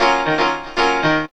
P. CHORDS, KEYS

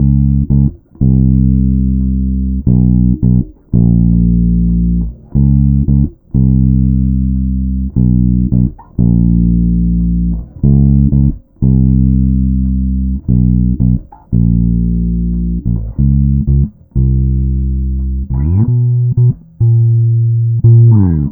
Bass, Electro Lounge; Sonic Foundry (Sony Creative Software); 090 Below Bangkok

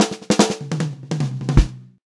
POP FIL, Pop-Rock Drumloops; 152 POP

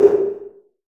LARGE ROOM 808, REVRB

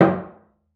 CELLO FINGER; 90 s Sample CDs; Xsample VOL-5

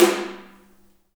HAIRCUTS, KENYA DRM, Heart of Africa

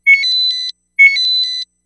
RADIO BLIPS; Distored Reality

